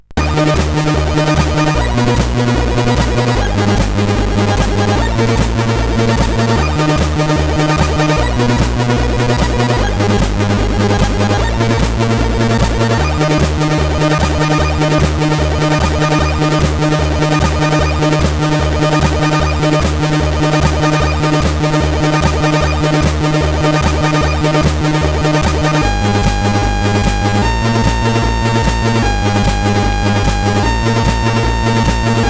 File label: Spook tune CSG8580R5; hvsc